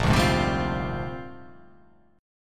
Bb 13th; Bb13 strummed

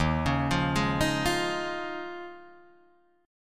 D sharp 9th Suspended 4th D sharp, Guitar Drop D

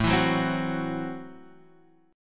A Minor 6th A Guitar Standard; Am6 strummed; 5,7,4,5,7,x; JGuitar

